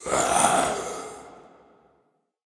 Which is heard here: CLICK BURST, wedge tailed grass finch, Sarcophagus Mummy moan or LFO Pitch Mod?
Sarcophagus Mummy moan